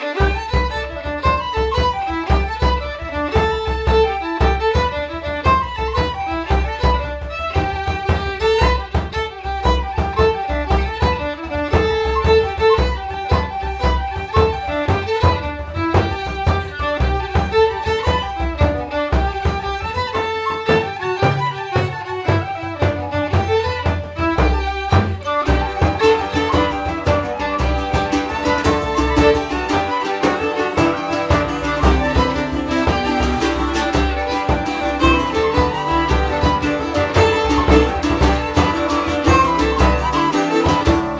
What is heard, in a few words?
Orchestra Ambience; Assassin's Creed III